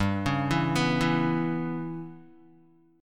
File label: G 6th Flat 5th, Guitar; G6b5 arpeggiated; JGuitar